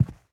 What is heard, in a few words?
Footsteps Sneaker Carpet Walk; Infernal Radiation